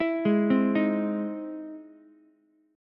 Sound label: E Augmented Major 7th E down Ukulele; arpeggiated; E+M7; JGuitar